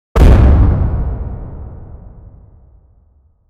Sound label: the sound of a strong punch landing on a surface; combat